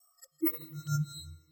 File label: subtle appear; soundmorph